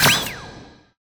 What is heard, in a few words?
Intense SciFi